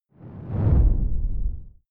whoosh simple low short grainy; swooshes-swishes

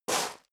step leather shoes gravel medium slide short; schritte

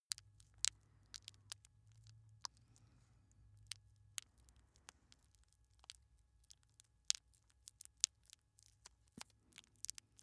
bird eat

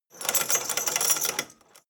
drawbridge winch hoist metal; maschinen; metall; soundpool

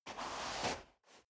step boots sand scuff

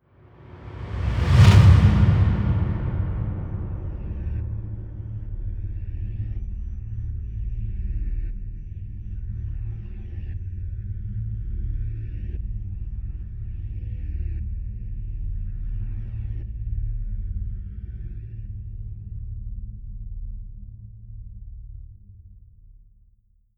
drone impact, drones

